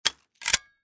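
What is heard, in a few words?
spyglass sounds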